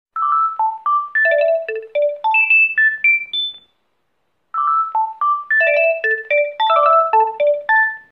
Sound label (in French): sonnerie de telephone